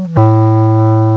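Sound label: CLARINET, REEDS; CMI Sound Library Rev 1.3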